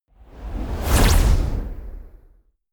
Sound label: sci-fi flyby plasma elastic